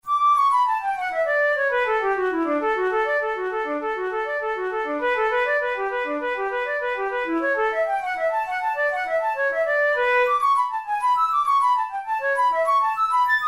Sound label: studies for flute solo; karg-elert